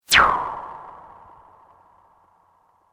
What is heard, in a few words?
Sweep Mechanical Fast